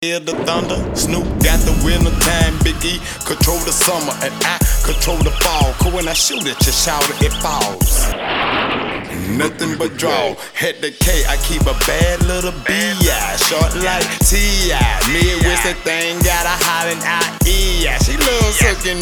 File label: kimball